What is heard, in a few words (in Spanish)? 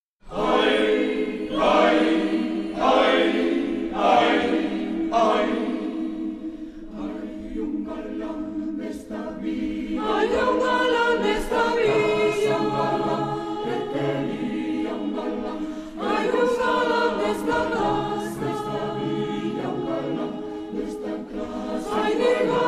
coro mixto; 6a Bienal; pena santa